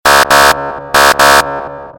Tuuut Message

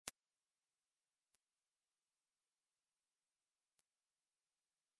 Irritaing Tone

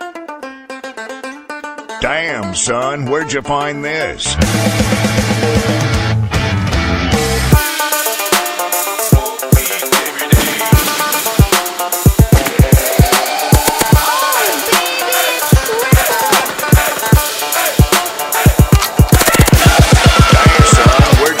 Meme Circus Ringtone

